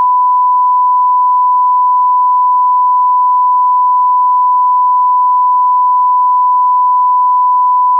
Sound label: Test Sine